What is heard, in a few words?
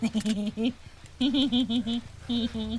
giggle